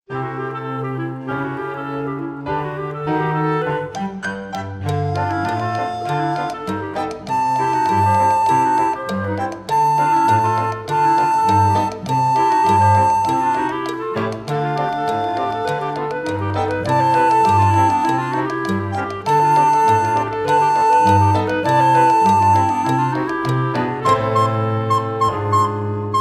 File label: Ragtime